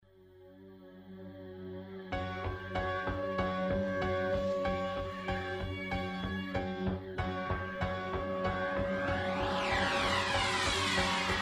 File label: noise; Virtual Hard Mondays Volume 2 Edition 17